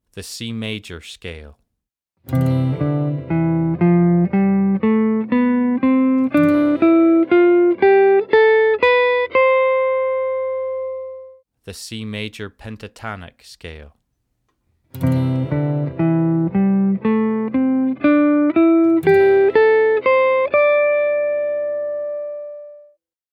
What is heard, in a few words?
Comparing Major Minor Pentatonic